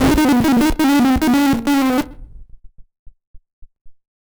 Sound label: random synth failure, malfunctioning synth